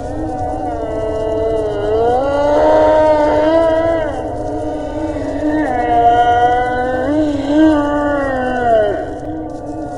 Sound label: Zombie; Halloween